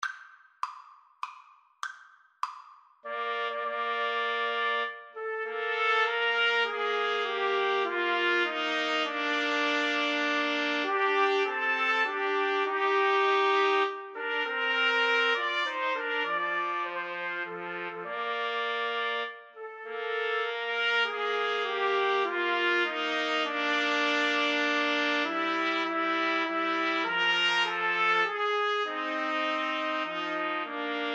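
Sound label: nocturne trumpets, karaoke; Trumpet Trio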